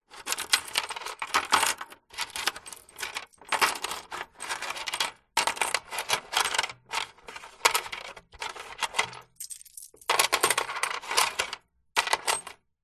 foley money coins handling movements in plastic cash register till drawer; zapsplat